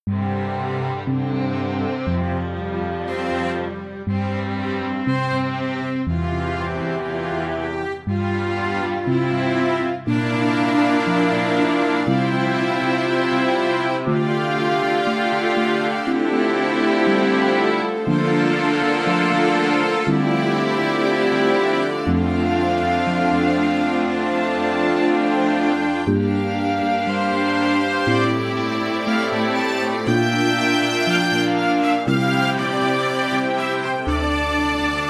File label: Underground Strings